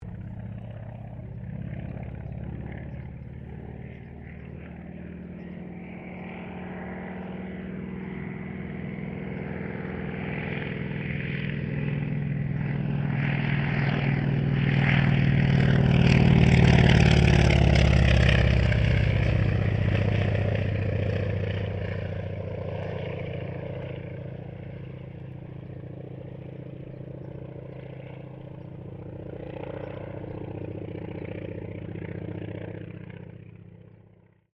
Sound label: AIRCRAFT CA-28 CERES PASS; Airplane; Airplanes; Vehicles